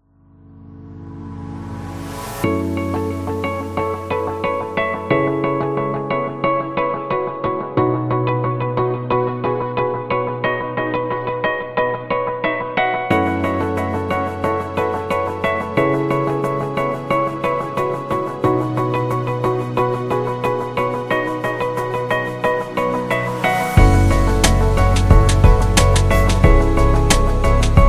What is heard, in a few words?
Nature Timelapse, lounge